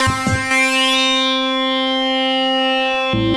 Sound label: SYNTH, 140 BPM, Drum & Bass; Ueberschall; Drum & Bass Resonance